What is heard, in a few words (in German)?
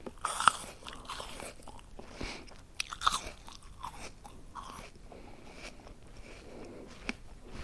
Apfel